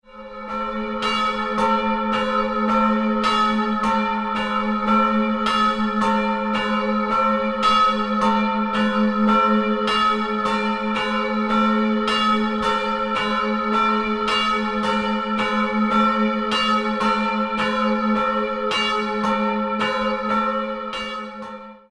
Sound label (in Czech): police souzvuk